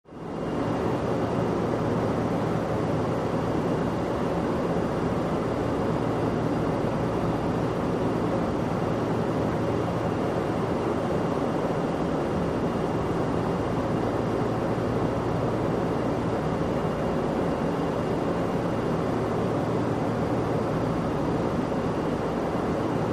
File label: 747 Jet Interior Pas